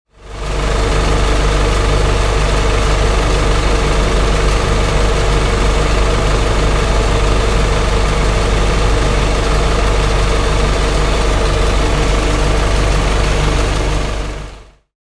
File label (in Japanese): densya